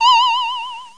tv turn; Stanley's Sticker Stories (1997)(Edmark)

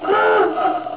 dive horn

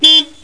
klaxon; Mobiclic46

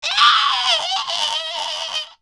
Harpy; Hellboy

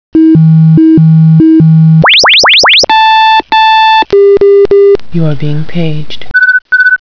Page Sound; CyberBuddy

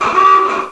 Warning; smIRCle

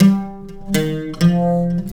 SITAR ETHNO 125; eJay; Guitar

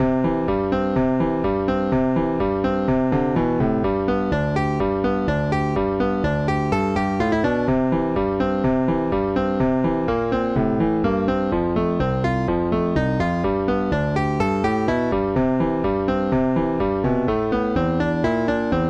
aubergine rag

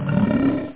roar; 7realms

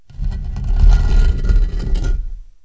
tomb; KABOOM! - Wild and Wacky Sound Software; weird

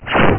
fill; mruwurm; Creative Voice

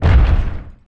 bigfoot l; Starsiege; PC Gamer IT CD 35

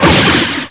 LASER; tv3d

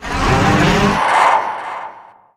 mine cart creak; darwinia demo

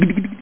bonk; apogee

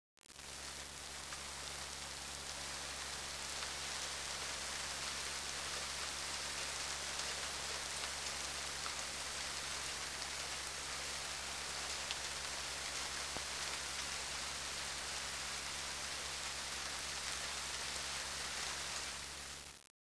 STORM; ambient; weather

